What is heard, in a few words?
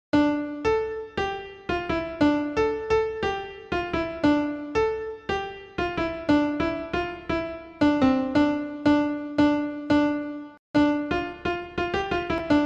theme song bgm on piano ringtone; pavan kalyan